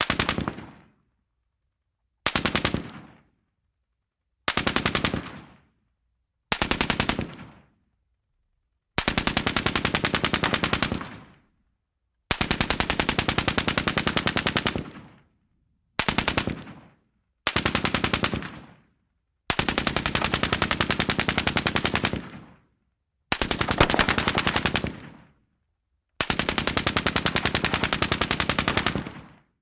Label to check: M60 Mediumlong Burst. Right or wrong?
Right